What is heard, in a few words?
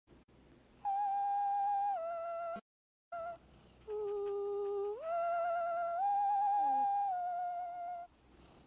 gizmo singing; gremlins